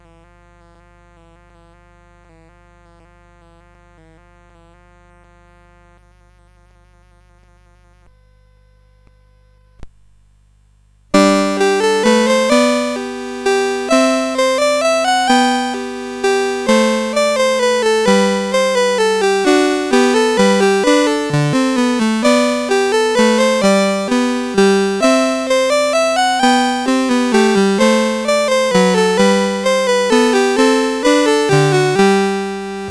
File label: Minuet; CSG8580R5